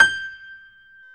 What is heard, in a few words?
PIA GRDPI, Roland